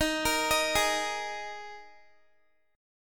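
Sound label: E flat Suspended 4th Guitar, chord; arpeggiated; x,18,20,20,20,x; 4E,4A,5D,5G,5C,6F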